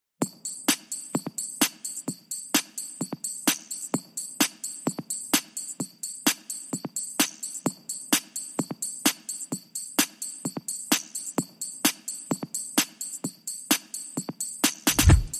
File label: Beats No Claves